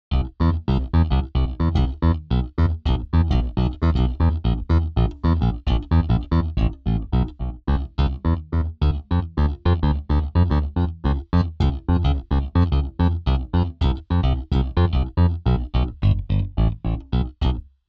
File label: bass